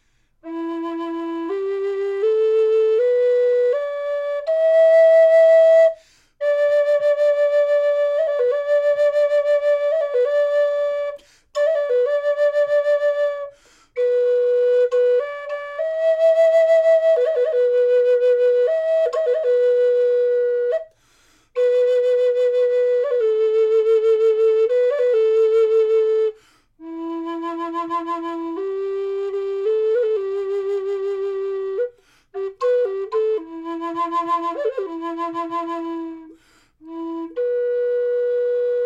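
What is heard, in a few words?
natural, wood flute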